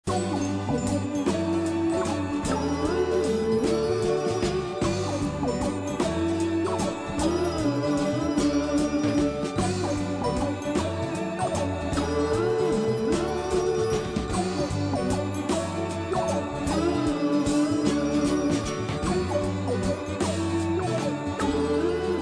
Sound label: Moog